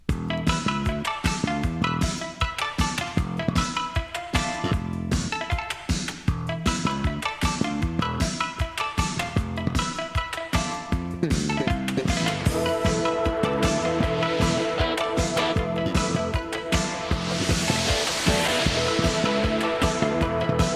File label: synthesized magic